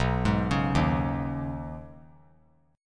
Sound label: B 6th Add 9th B, chord; arpeggiated